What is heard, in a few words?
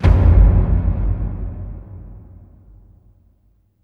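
BIG BOOM, AMBIENT DRUMS; Spectrasonic Distorted Reality 1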